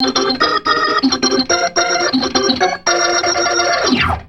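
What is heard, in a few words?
RIFF right; InVision Interactive; Keith Emerson; P.BLOOD OCTV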